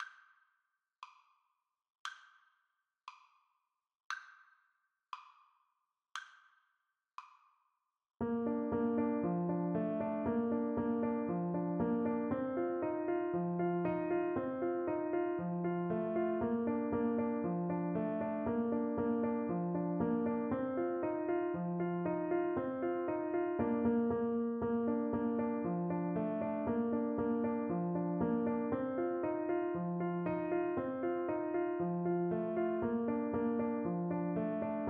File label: cincinatti hornpipe TPT